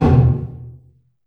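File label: large room 909, reverb; TR 909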